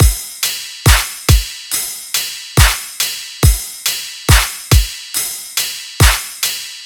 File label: Drum Full 140BPM, dubstep; Ghosthack; AC2024 - Day 13 - Dubstep Sounds 2025